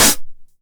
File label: 909 HH Tape Sat; Goldbaby Tape808 & Tape909; The Tape 909